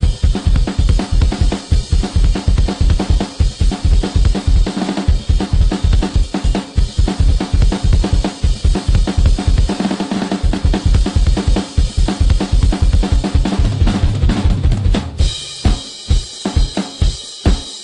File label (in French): Batterie; Assassin